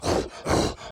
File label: Enraged Breathing Loop; The Town; Burns